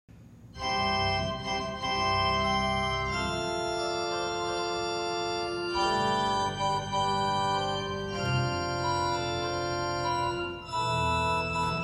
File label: 8 4 coupled, organ